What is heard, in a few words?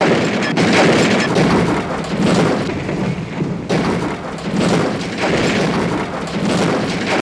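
Crash Looped Long Roll, car crashes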